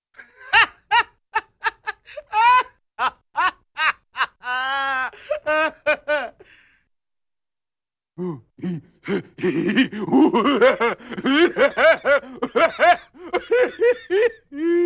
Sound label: Funny Goofy Laughs, Cartoon; Hollywood Edge; Cartoon Trax